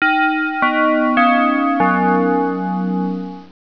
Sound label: Grandfather Clock; psion cd vol1